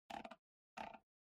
PC Mouse Far Scroll

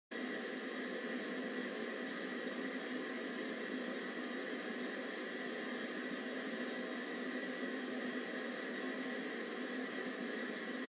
Radio Signal Noise Far FM

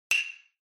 Hyoshigi

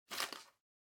Bingo Card Noise

